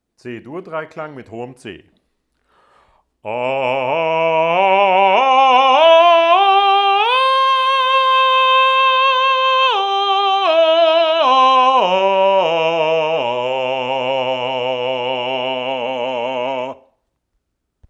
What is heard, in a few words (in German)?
lautes hohes C